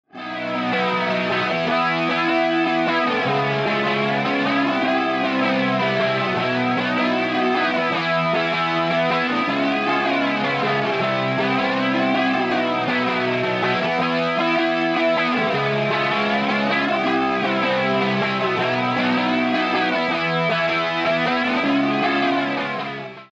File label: FLANGER; gr5 presets breakout 2 in 1